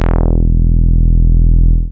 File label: BAS Mini, BAS1SYN; Best Service Gigapack 2